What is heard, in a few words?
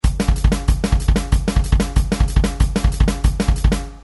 beat